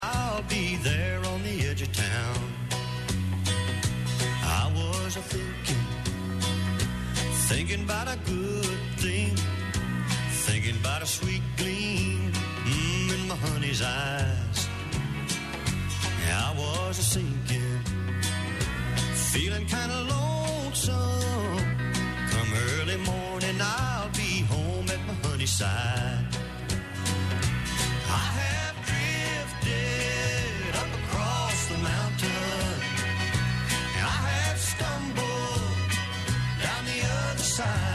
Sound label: country; 94.7 WBCQ Monticello ME live stream parallel 6160 KIXX Country 1158 UTC